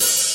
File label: HH, HIHAT HIP, Dance Mega Drums